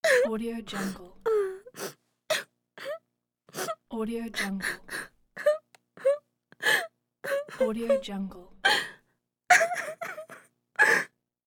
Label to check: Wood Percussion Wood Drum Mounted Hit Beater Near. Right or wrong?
Wrong